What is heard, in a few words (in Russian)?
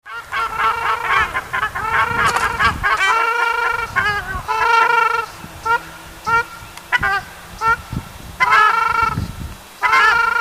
ogar golos